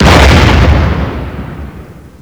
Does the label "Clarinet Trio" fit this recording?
No